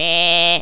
baaaa; GasWasserTest

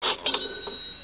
KACHING

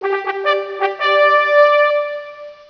fanfare; Caesar3

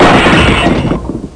broom

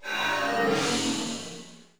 Synt; SYNTH; 120 BPM

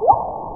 Swup; Realmz 6.0.3; The Family Jewels

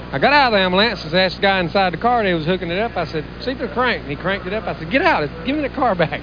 ambulance

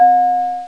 Tinkle Bell (C3); X-Wing

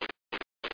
chews cuticle; Get Ready for School, Charlie Brown!

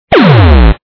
lost Ball; cyballs

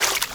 watter step; wob2 demo

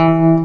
guit; BLOOD2; Ancient

